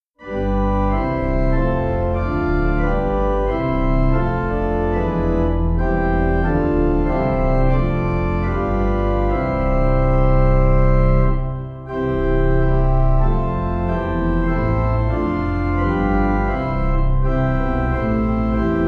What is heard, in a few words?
Organ